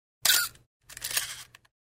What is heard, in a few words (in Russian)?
zvuk camera